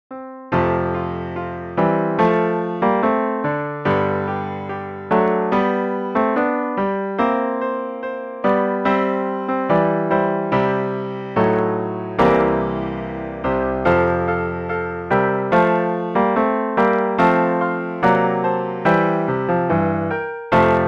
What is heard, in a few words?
piano duet; High on the Mountain Top